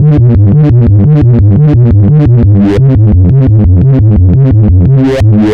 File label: Rave Bass, Drum n Bass; KJ Sawka; Drum n Bass & Beyond